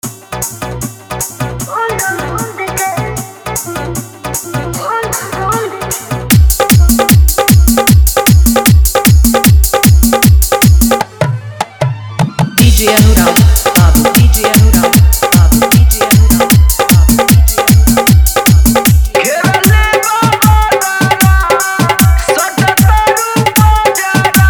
New Bhojpuri Hard Dhollki Bass Mix

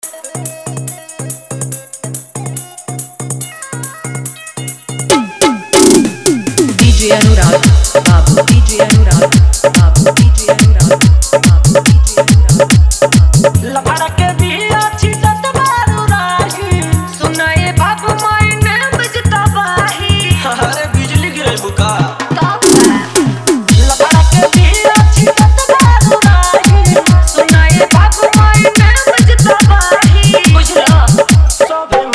Bhojpuri Hits Dj Song

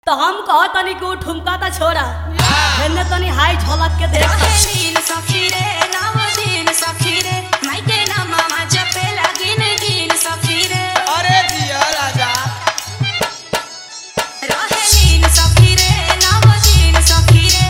Navratri Dj Song

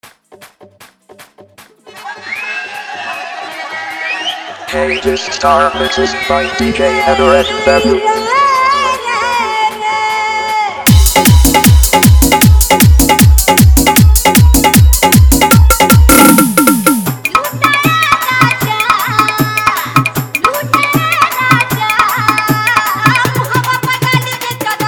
Fully Dhollki Bass; New Wedding Special 2025

